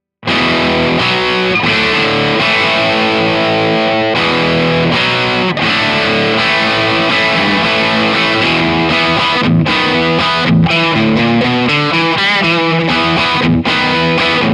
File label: rock chords; jensentone